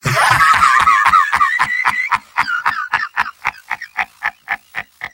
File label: sniper laugh long; vo; tf2; mvm